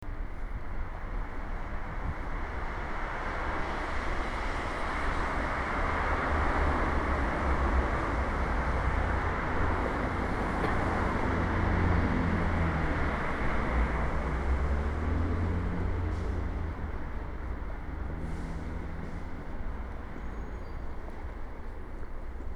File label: car ambience